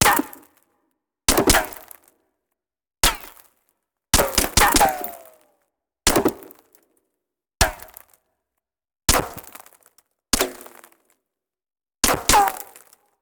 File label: Bullet Ricochet; Sound Ideas; Guns